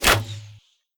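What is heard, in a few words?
attack bow and arrow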